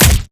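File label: micro missiles fire, weapons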